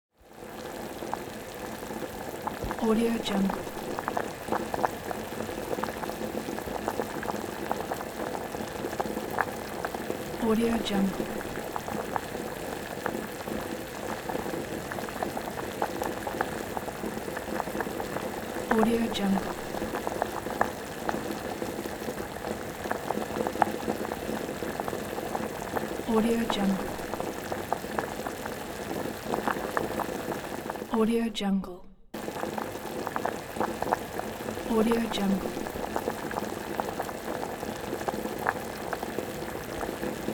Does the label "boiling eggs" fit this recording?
Yes